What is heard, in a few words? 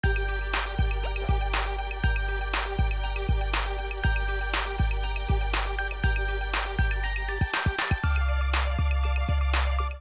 Music; MetroPCS